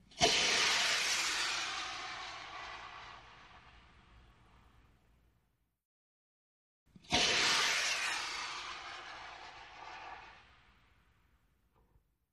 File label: Rocket Flare Gun: Firing Into Sky. Stereo; small rockets